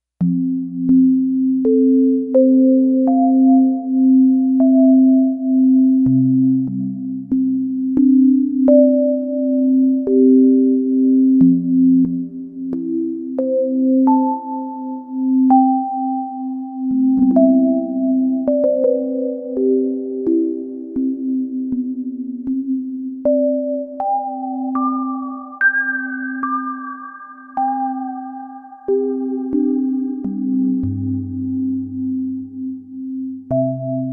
SINE SUBLIME; Digital Synthesizer

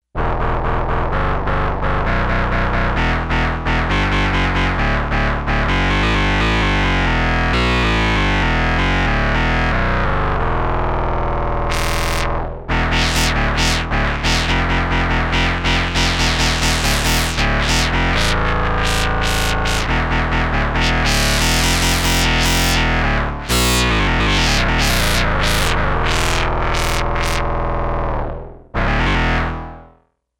Sinister Bass, Arturia MicroBrute; Factory patch sheets

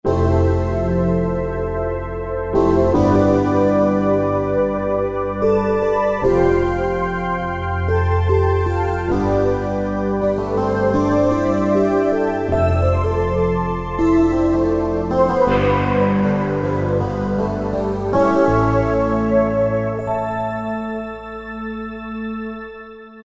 Korg T3

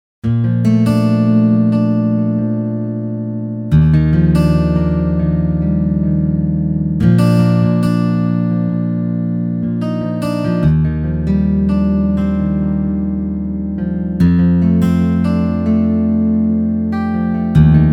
Acoustic Guitar

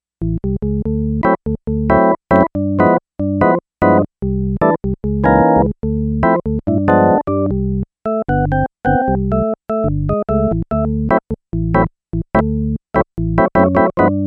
E. Organ 3

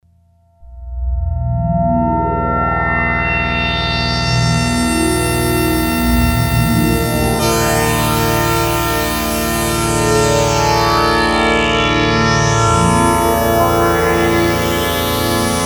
Fourths With Resonance

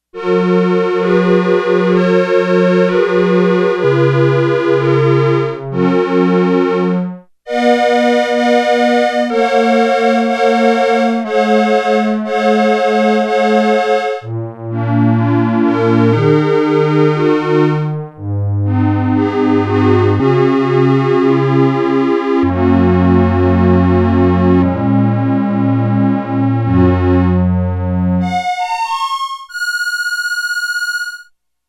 Harmonium

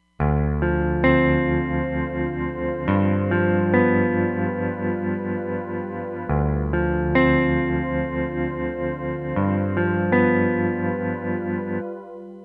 Non linear Rm, Roland DEP-5; Factory Patches

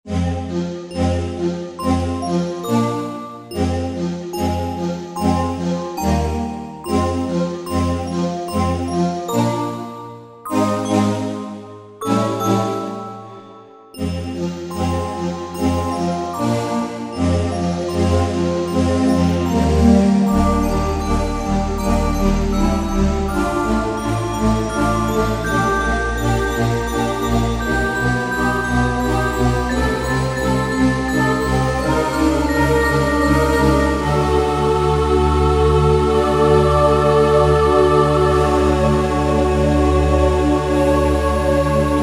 Air Chorus & Bell, Korg Wavestation A-D

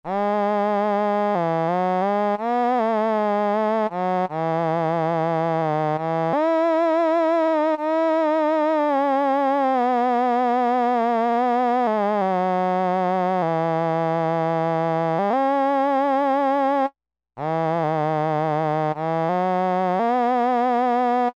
tenor, Korg M-500 micro-preset

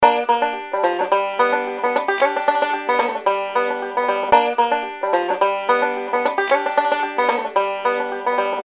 Rural Banjo; Apple GarageBand 2; Apple Loops

